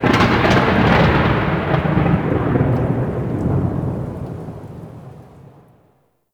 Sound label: Void Storm Thunder Clap; Warframe